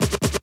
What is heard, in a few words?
Vinyl FX and Scratches, FX Scratch